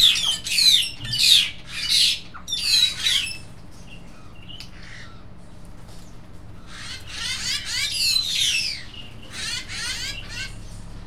csőrícsek, egyszínű királycsőrícse; szegedi zoo 2011

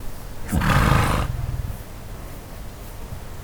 prüszköl, ló; állathangok; paraszt udvar

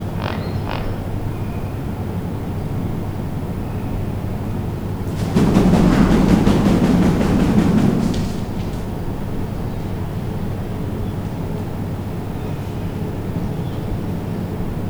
egymagában, törpeflamingó; debreceni zoo 2012; marantz puska

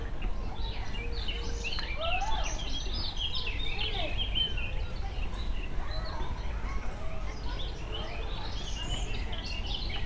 balról jobbra száll, holló; akg; allathangok; veszpremizoo 2013

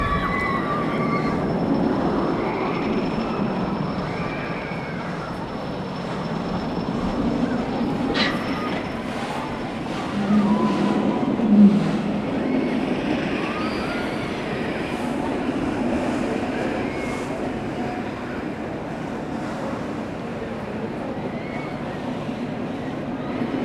kastelysziget magasabol tulparton hullamvasut

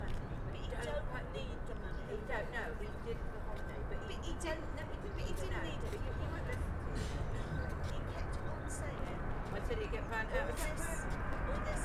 földetérés előtt levő repülő beszélgető emberek

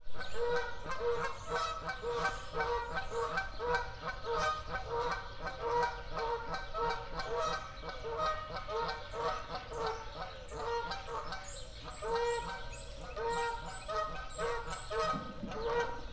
a madarak felé közeledve, kanadai lúd; debreceni zoo 2012

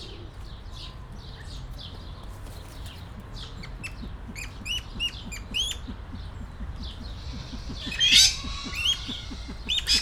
balra aranyfácán, középtájon ezüstfácán, jobbra juhok; kecskemeti zoo 2016

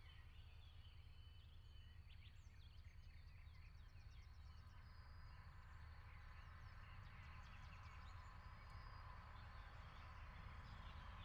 autóforgalom az átjáróban, sorompó lecsapódása; vasut; vizvar; cardioid

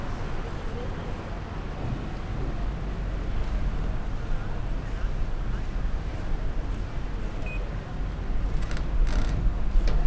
jegykezelo automata, vaporetto; velence; olaszorszag 2014

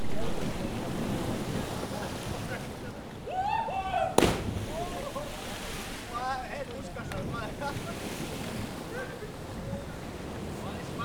vízbeugrás

